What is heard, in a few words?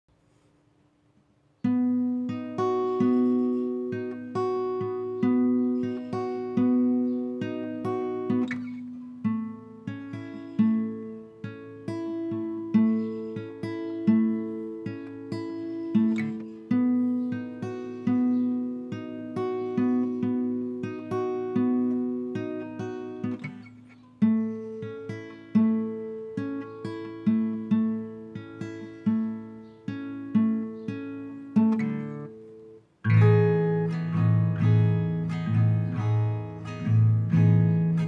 Guitar Attempt